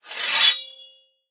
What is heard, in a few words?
minion battlefoley swordscrape; smite; npc; roman archer